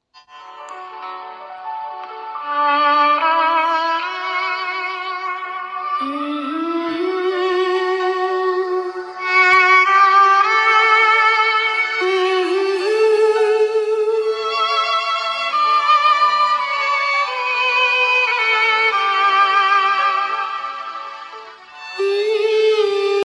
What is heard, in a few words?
music bollywood song melody